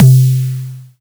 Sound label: Drum Body